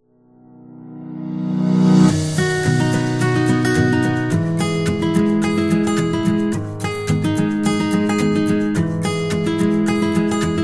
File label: Happy Guitar, happy music